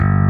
bass

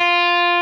Arp.

